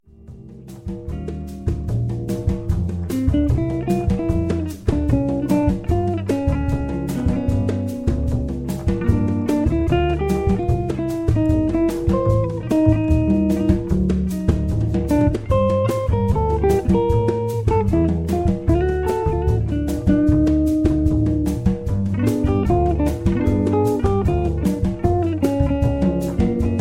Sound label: ensemble majazztic medley instrumental trio